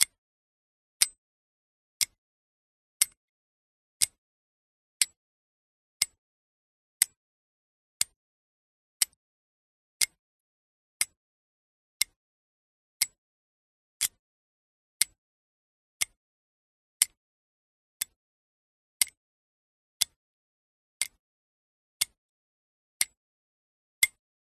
antique clicker clock ticking tick tock click rhythm time watch countdown